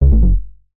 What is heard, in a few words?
game tone error